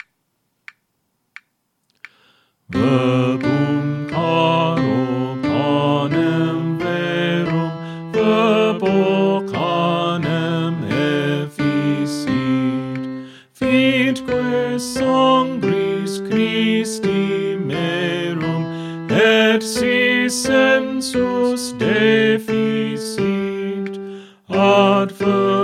Soprano; Pange, Lingua, Gloriosi; St. Columba Choir Practice Tracks

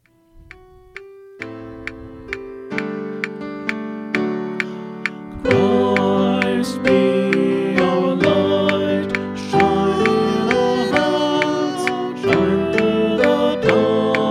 Refrain All Parts, Choir Practice Tracks

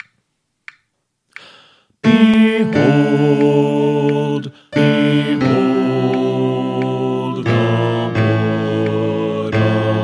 Bass; St. Columba Choir Practice Tracks

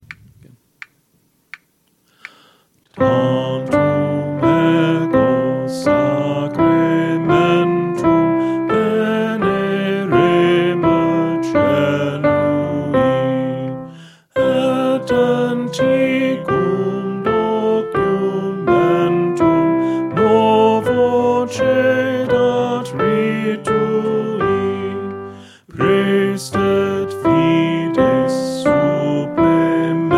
Soprano; Hail Our Savior's Glorious Body; St. Columba Choir Practice Tracks

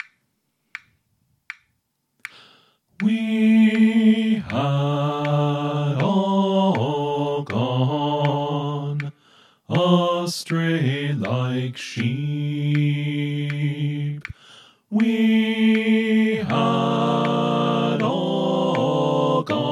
Refrain All Parts

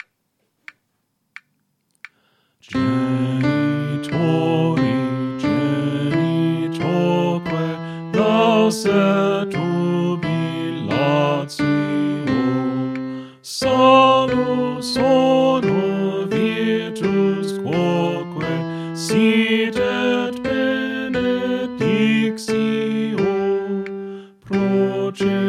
Soprano

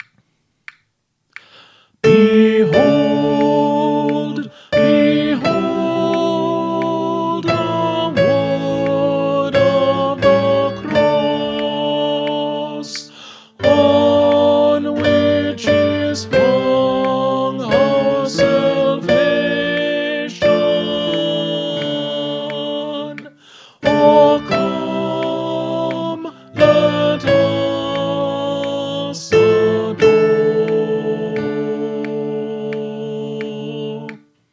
Soprano; St. Columba Choir Practice Tracks

